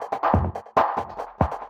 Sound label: TECHNO EXTRA 140